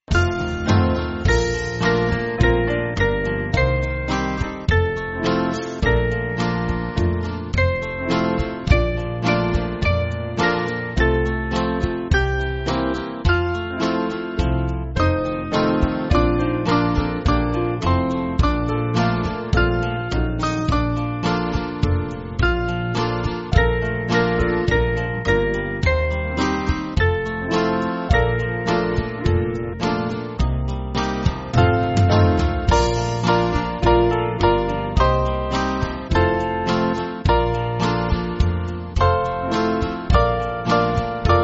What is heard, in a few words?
How Pleasant How, Band; Woodbury